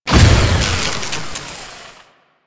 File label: Energy Ribbon Shutdown; Warframe; Veilbreaker; Quests; Kahl